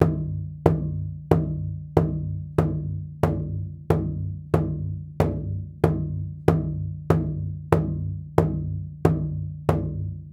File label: Big drum; Nightfall (Tabbyewik)